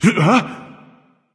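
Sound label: nonverbal surprised; smite; voicepack; ullr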